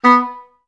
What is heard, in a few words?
EHS STAC B2, Advanced Orchestra; Best Service; Peter Siedlaczek's Advanced Orchestra